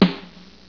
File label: SNARE